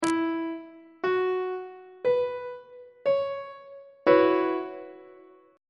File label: f sharp dominant 7th suspended 4th 3rd inversion; on treble clef